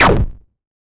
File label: Tidal Thrust (Sound); Gloom